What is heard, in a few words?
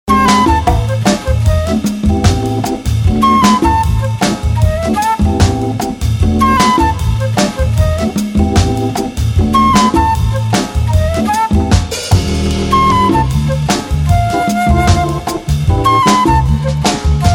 FLUTE BOOGALOO